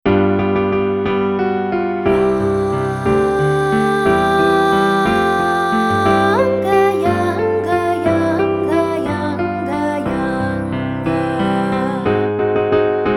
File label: Vocal Warm Ups Yawning; Vocal Warm Ups Volume 2